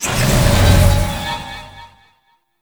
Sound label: Mirror Portal Confetti Burst; Warframe; Duviri Murmur